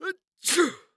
Human Male Sneeze

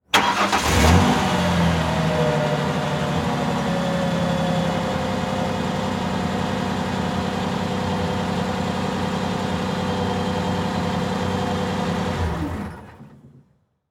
Truck Ford Ranger Start Idle Off Mic In Rear; transportation

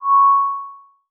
Beep High Pitch

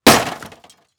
Impact Metal Smash Short; Impacts

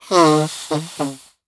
Human Male Blowing Nose

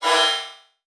Multimedia Internet CD-Rom Flash Short

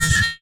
Pop Up Electronic; Multimedia Internet CD-Rom Flash

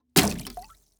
Liquid Water Water Jug Hit Surface Of Water Hard

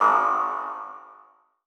Beep Long; Adobe; Multimedia Internet CD-Rom Flash

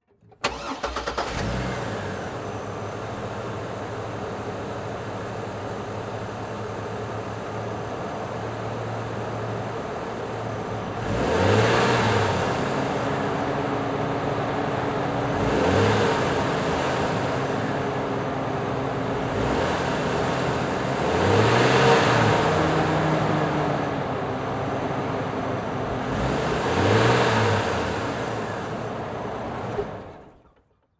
Chevy Trailblazer Start Rev Off Exterior; transportation

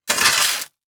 Industry Tool Shovel Dig In Rock Salt

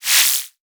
Multimedia Internet CD-Rom Flash Electronic

